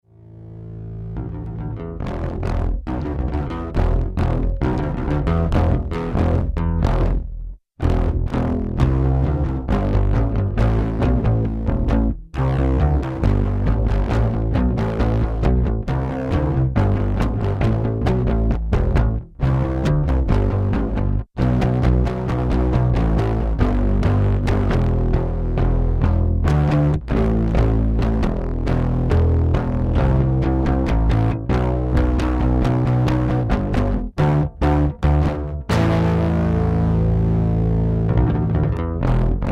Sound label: Basses